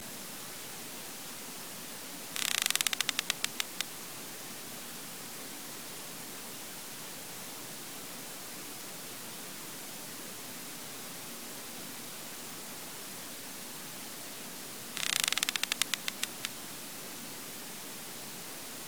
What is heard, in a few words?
mysterious ticking noise